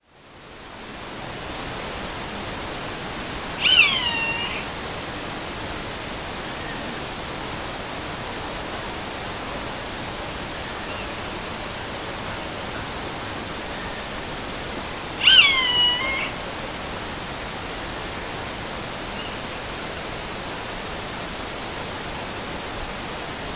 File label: Rupornis magnirostris; Lag Diario, UY